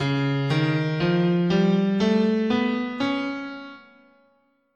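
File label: D flat Whole Tone, guitar, scale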